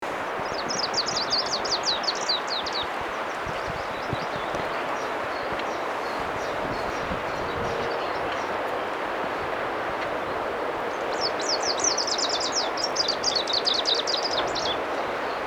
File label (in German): gruenlaubsaenger